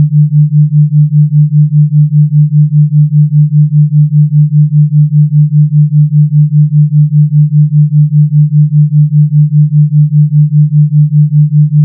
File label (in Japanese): バイノーラルビート A